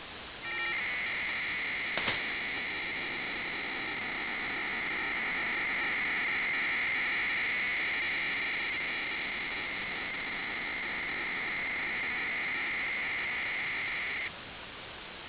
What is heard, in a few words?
bpsk-500bd